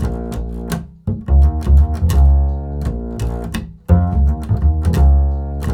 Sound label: acid A#, 85 acid swing, bass; Spectrasonics; Bass Legends